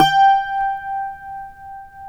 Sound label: CUATRO; Spectrasonics; Hans Zimmer Guitars VOL-2